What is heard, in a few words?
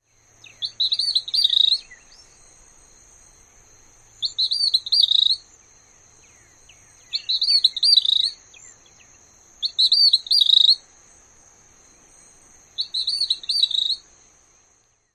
dawn song